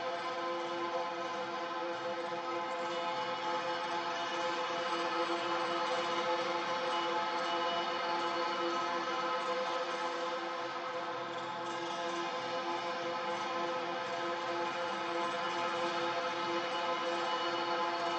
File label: Amb Musical; Botanist